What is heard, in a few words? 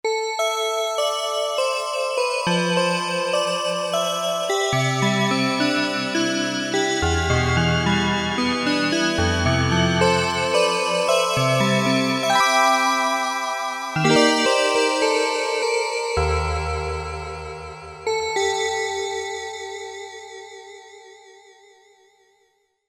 digibell; sampler; Guess the sampler game